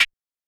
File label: snare rim B minor; osu